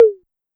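Tom 808 Down, Drum Machines Clavia DDrum-AT Pitch Envelope; 300 Drum Machines; Pitch Env Various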